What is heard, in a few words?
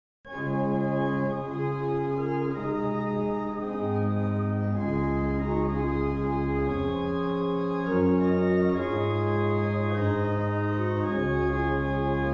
barrel organs; Shelland